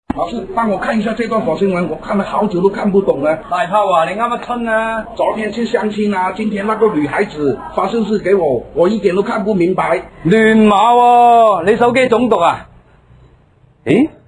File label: laugh; breadradio